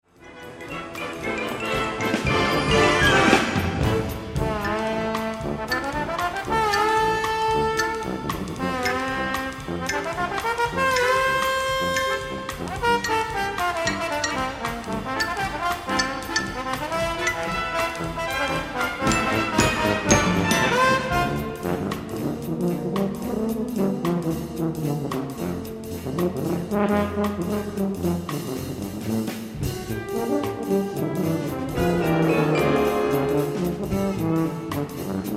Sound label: Jazz with Brass Quintet, Gala Concert; Gala Concert 2002